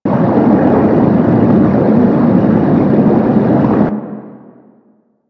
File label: Water Through Pipe Large; Ambience; Warframe; Grineer Galleon